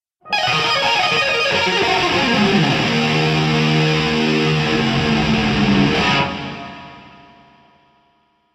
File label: lick; brutal legend